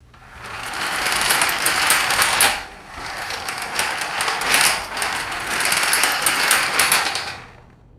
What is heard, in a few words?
plastic roller shutter rattling and rolling